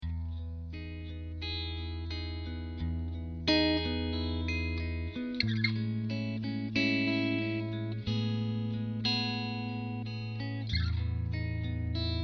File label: Jazzmaster pickups